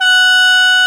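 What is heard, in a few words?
F#3 SHENG, CHINESE SHENG; AKAI S6000 CD-ROM - WorldSounds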